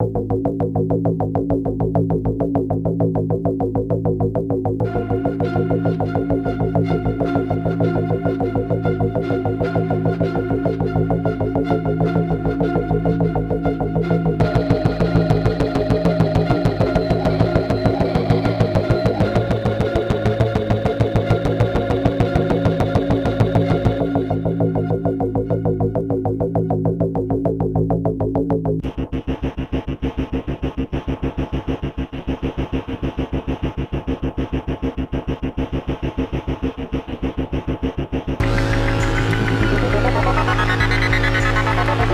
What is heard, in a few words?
attack; Dying Light The Following